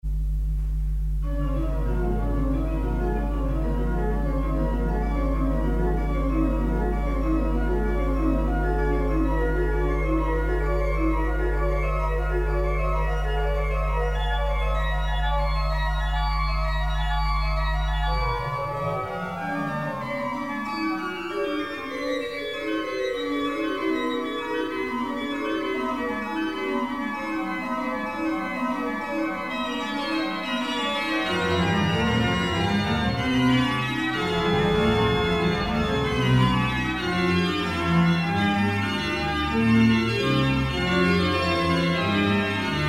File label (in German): Triosonate fuer Orgel; 3. Satz